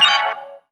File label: Synth Sound; Vengeance Samples; VEE Synth Shots